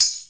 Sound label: straight tambourine; Western and Latin Percussion; single hits